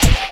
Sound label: Trap Snare; Sonic Foundry (Sony Creative Software); Esoterik Beatz; Trap Kit